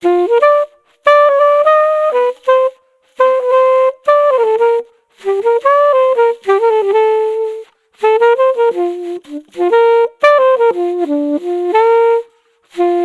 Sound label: Alto Saxophone